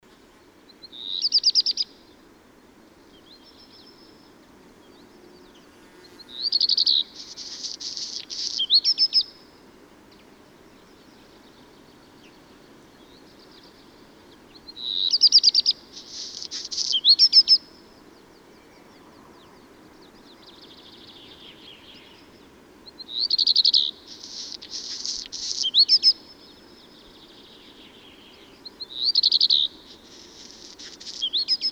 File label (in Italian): Codirosso spazzacamino; valsolda